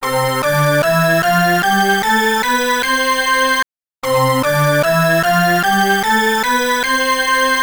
Speaker Check; F-1 Super Battle; Jaleco Mega System 32; arcade

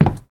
Wood soft

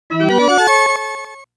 START (Push Start)

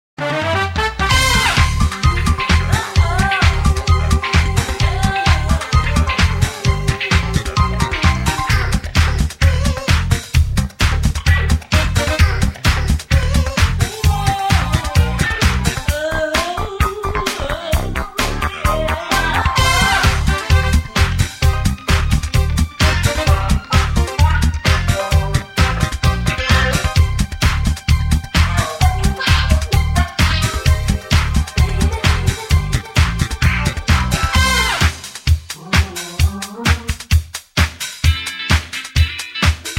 cartoon disco

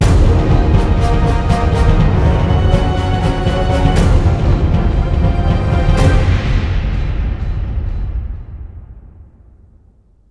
medium tasks complete; Daemonheim

